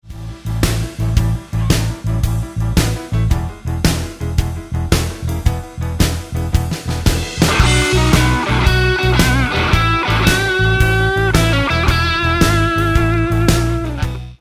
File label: Roadhouse Licks Lick, guitar; 20 Roadhouse Licks